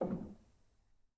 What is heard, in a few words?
rel, Grand